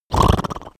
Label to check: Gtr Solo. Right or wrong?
Wrong